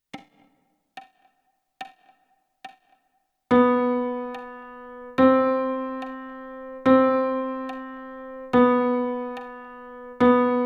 Playalong Chromatic Trainer E Flute small range, bansuri; playtalong tracks to train the chromatic notes on the bansuri